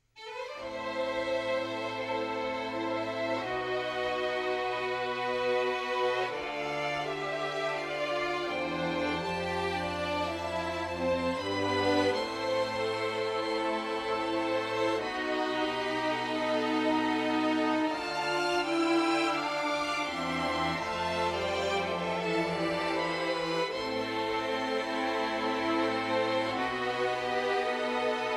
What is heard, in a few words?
strings